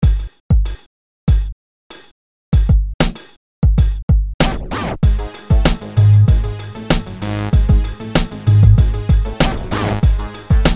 punk rap

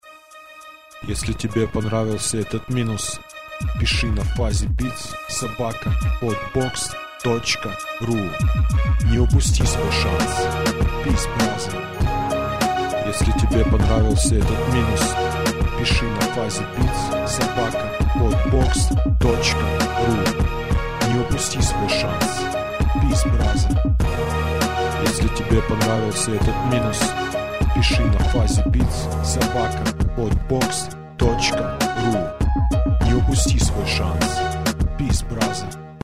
anthem

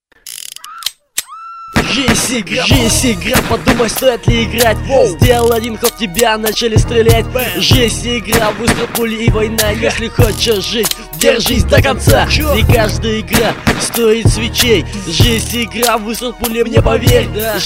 hip-hop

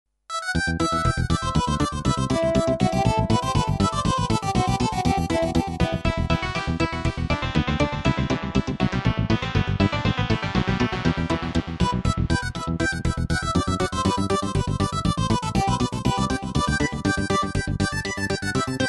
hornpipe; henry purcell; 1659 london

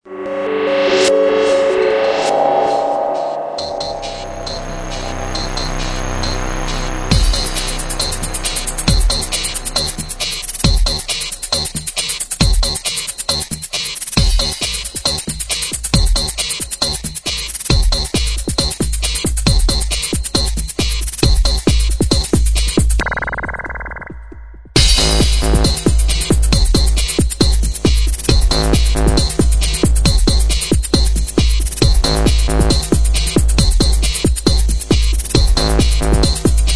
techno

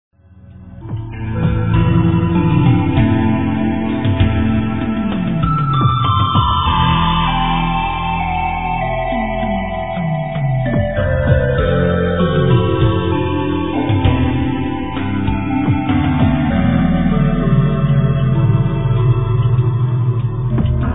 symphonic rock

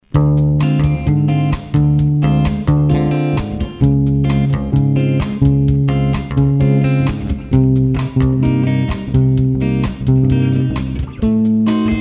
bossa

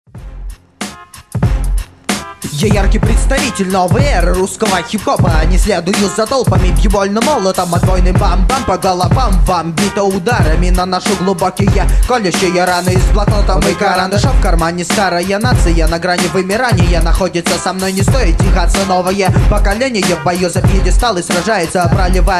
new age russian hip-hop

